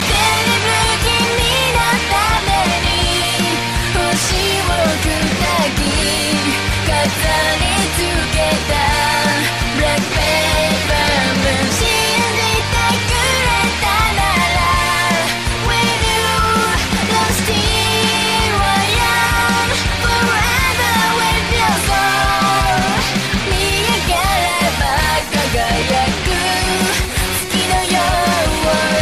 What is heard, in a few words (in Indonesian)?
Nada Dering Anime; Soul Eater